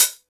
clear closed hi hat sample B key, pedal hi-hat tuned to the B note; hat samples